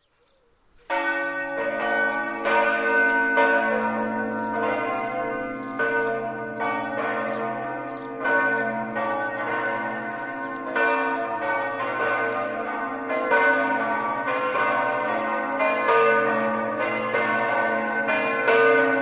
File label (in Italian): gonars, campane